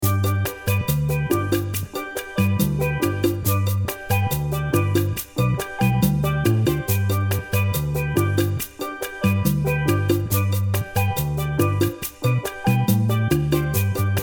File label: one chord backing track (G); Calypso; Caribbean music; Calypso is a style of Caribbean music that originated in Trinidad and Tobago in the 19th c